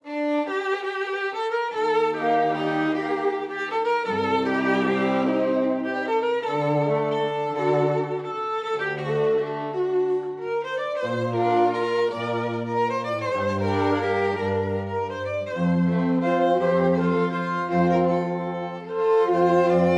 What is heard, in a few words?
String Quartet